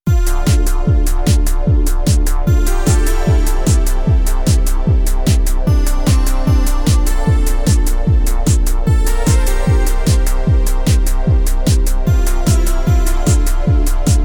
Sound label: Jingle; Starbuck